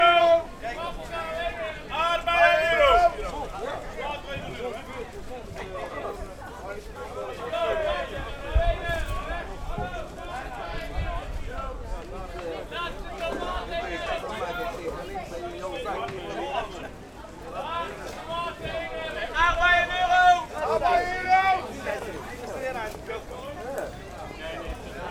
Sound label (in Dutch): Markt; Helmond